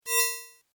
Tech rollover, Rollover: Short, High Tech Effect; Mouseovers (Sound Effects:Multimedia)

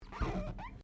Glass Squeak Rubber; Material components for constructing more complex sounds; Materials

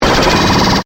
Hit Electronic, Distortion, Beeps; Production Element, Imaging Element, Accent, Transition; BlastWave

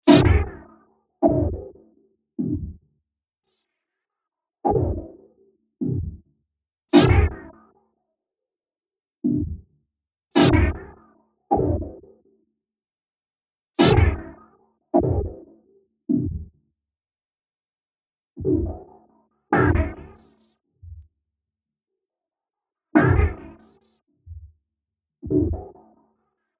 Heavy Impacts A Loop; Miscellaneous Impact Sound Effects; Looping Sound Effect

